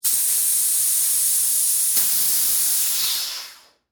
Air Release Valve, Industry, Air, Release, Air, Compressor, Valve; Air Pump; BlastWave